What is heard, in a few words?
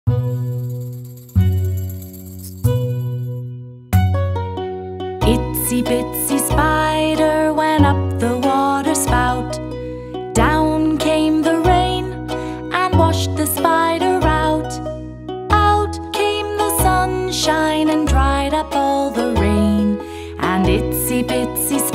ITSY BITSY SPIDER Song for Children; english poem for child